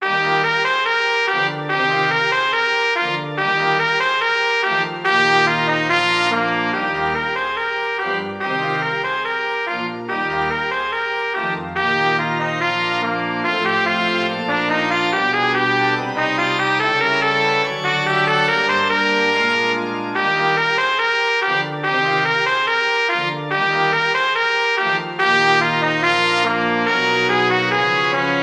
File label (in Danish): indtogsmarch